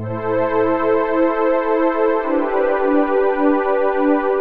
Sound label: Juno106 Strings